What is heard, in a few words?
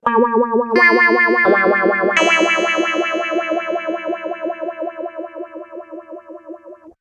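clean guitar pigtronix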